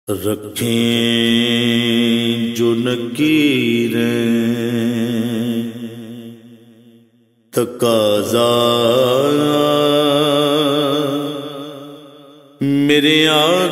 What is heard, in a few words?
audio naat sharif